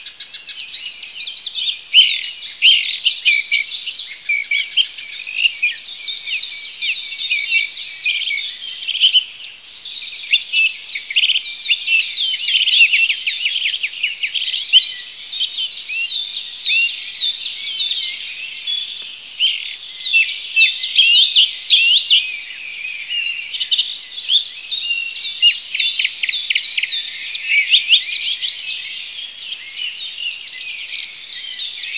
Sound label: birds chorus